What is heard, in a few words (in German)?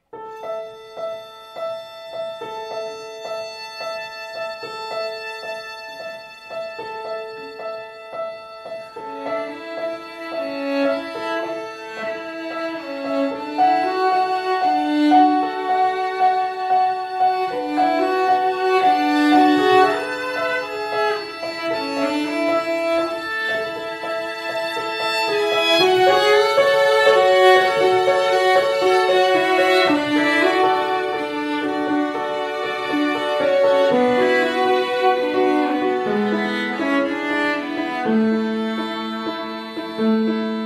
Klavier Geige und Cello